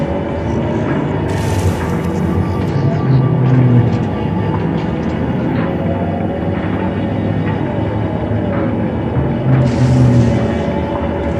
Robot Arm Track Med Mid; Boomtown; Titanfall 2

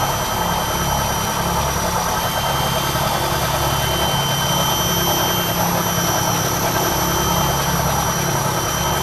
drone idle; Titanfall 2

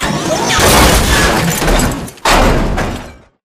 melee chest punch; Titanfall 2

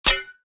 imp bullet light ballistic titan; Titanfall 2

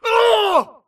grunt death crush; Titanfall 2

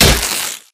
imp bullet generic headshot android; Titanfall 2

